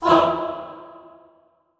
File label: FAH E2, Classical Choir; Best Service; Extended Classical Choir